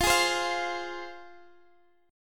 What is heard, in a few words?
F Minor, F, Guitar, Open D; x,x,15,14,15,15